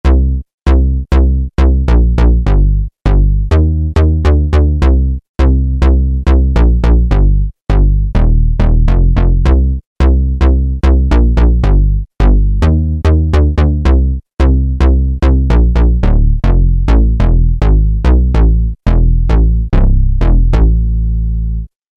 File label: MemBass, Peavey DPM Spectrum Bass